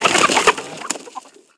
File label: animal chicken disturbed